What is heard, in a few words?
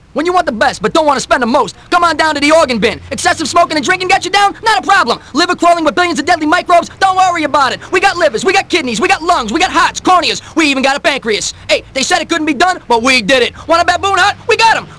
Organ; You Don't Know Jack